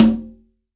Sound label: CONGA, STEREO CONGA